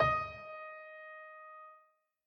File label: Piano